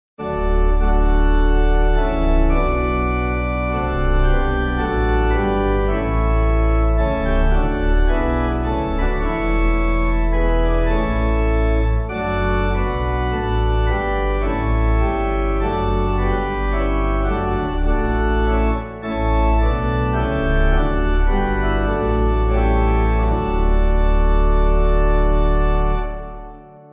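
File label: Pipe, Pipe Organ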